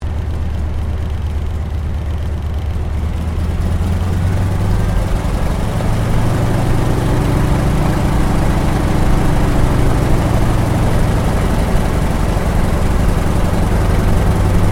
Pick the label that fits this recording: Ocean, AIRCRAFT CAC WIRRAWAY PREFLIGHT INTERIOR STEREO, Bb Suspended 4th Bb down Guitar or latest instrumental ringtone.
AIRCRAFT CAC WIRRAWAY PREFLIGHT INTERIOR STEREO